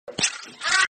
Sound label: slap ahh; meme